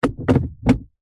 vehicles car gear transmission stick manual movement into gear engine off; zapsplat